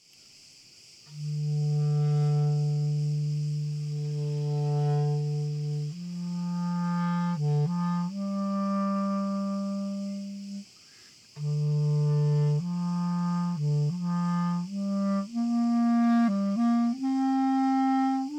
karidi Stand Variation

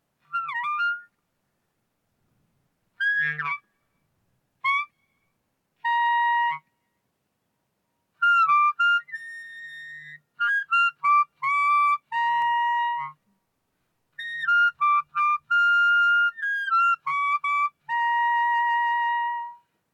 Ober Ton Rein Spiel

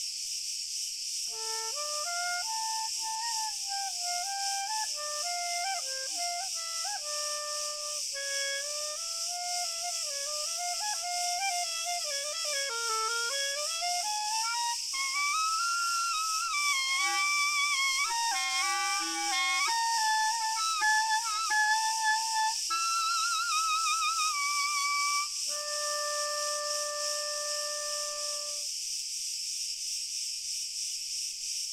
Stand Hoch Tief Schoen Spiel Grillen Chor Cl Fern

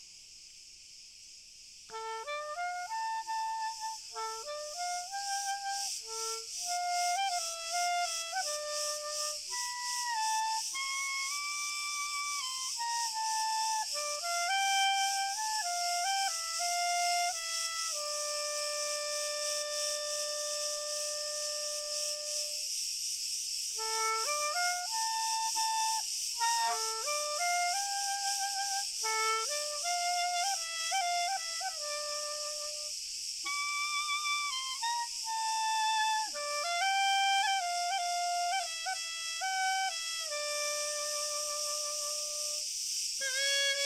Stand Schoen Spiel Grillen Chor Fern

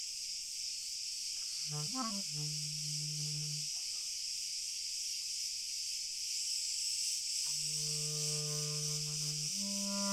Grillen Standard

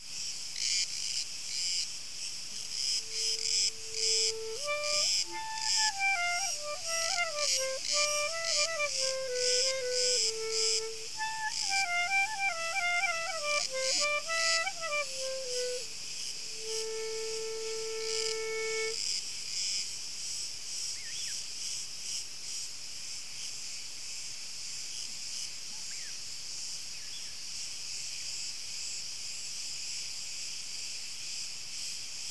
Grillen Stand Hunde

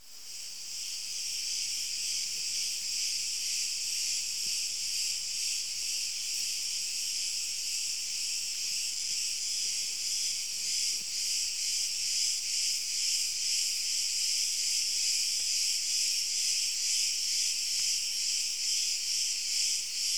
Grillen Rein

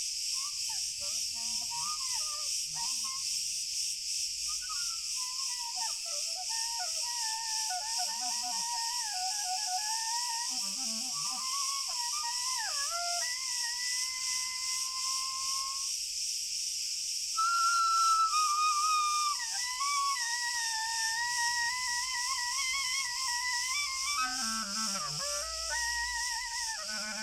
Frei Hoch Spiel Grillen Chor Sehr Fern

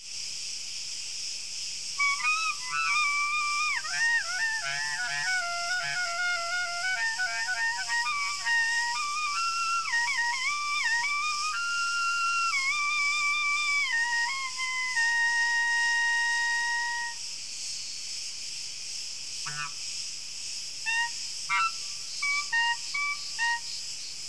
Grillen Frei Ober Ton Spiel

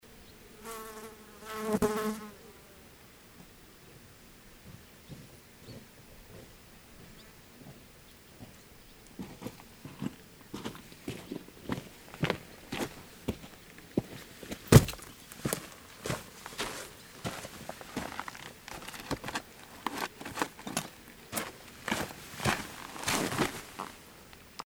Schritte Ambiente Abbau; Herm Aphrod Tem; kreta

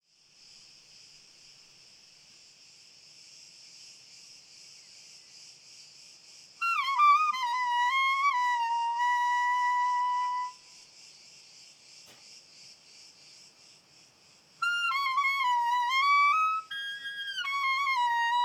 Oberton Spiel Grillen

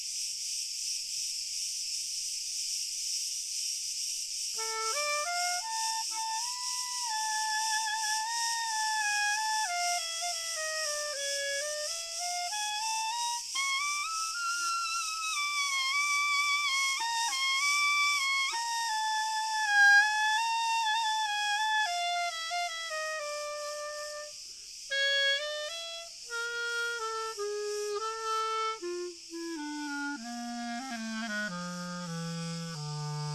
Stand Hoch Tief Schoen Spiel Grillen Chor Cl Fern

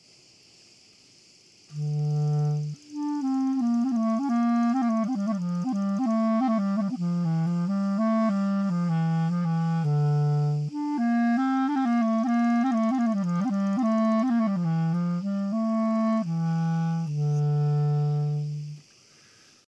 karidi Einspielen

